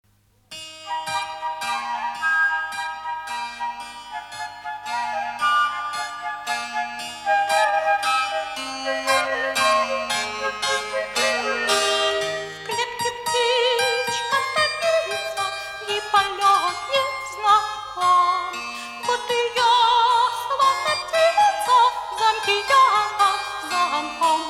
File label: duet; princessa trubadur; duet princessy i trubadura